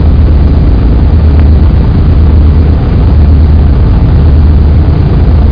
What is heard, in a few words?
prop